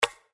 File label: Btn Click; Chic Town